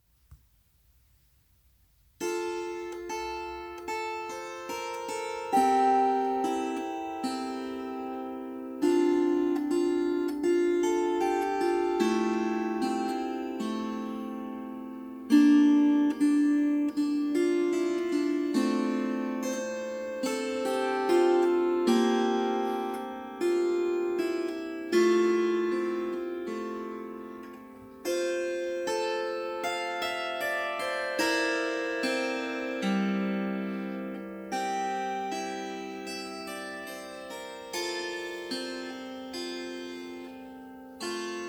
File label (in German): Veehharfe